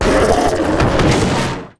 death, statue

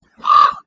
raven sound

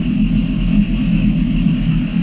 Idle Loop

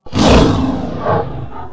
roam, Dragon sounds; Unreal

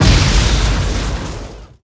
Explo

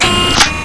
load

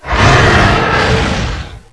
dragon roar; Unreal

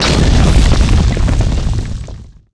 arise, statue

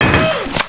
Hidraul

